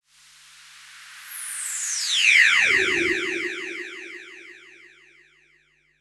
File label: Virus Noise